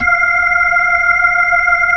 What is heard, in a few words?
B-3 Chorus